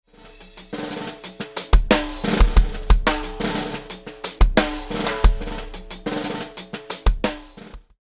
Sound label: snare with Sticks; fxpansion snareselects Ahead